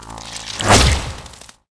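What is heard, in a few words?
scarab green attack, monster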